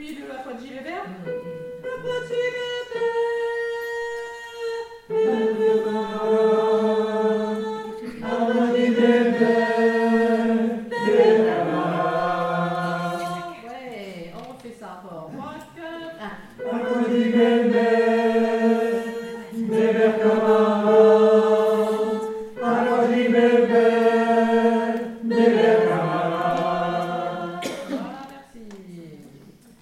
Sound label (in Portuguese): tenors